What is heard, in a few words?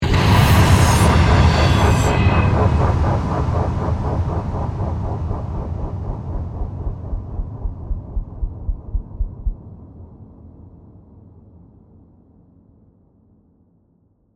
Hit Dark Pulse, Burst; Production Element, Imaging Element, Accent, Transition; Glitch